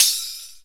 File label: Nanjira Hit; Digital Sound Factory